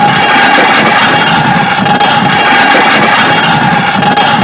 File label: scrape; Kart; AOS RF1 Kart Demo